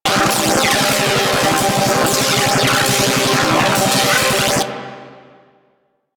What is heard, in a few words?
Turret Chain Lightning Beam Loop; Warframe; Zariman